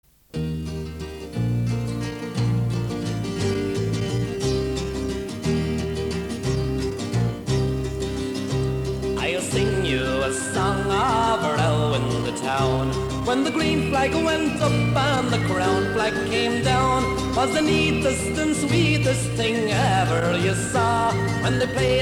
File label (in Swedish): irlandsk musik